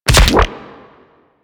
Weapon Punch Shotgun; Warframe; Weapons; Shotguns

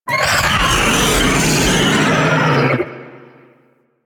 Vocal Pain Laser, Enemies; Warframe; Teralyst; Ropalolyst

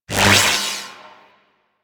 Chain Lightning Rifle Loop End; Crp Chain Lightning Rifle

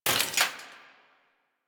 Arch Rocket Crossbow 2D Mech, Weapons; Warframe; Archwing

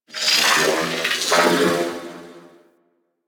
Corpus Ship Metallic Robo Chatter; Warframe; Corpus Ship Remaster

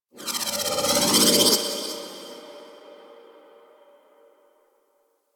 Sculpture Movement Legs; Mountain Pass; Warframe; Ambience; The War Within

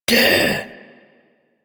Injured Grunt; Warframe; Tutorial; Injured Ostrons

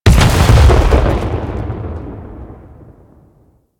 Arachnoid Camper Death Explosion; Warframe; Corpus

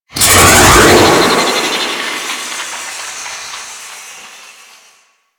Drone Charge; Warframe; Infested

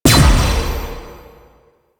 Player Weapon Projectile Parry; Warframe; Duviri; Duviri Dax; Weapons

